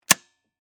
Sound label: Nerf Pistol Shoot, Foley, Pistol, Shoot, Dart, Fire, Toy, Gun; Toy Guns; BlastWave